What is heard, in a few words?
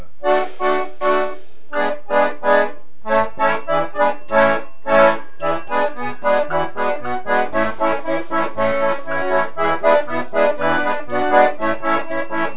concertina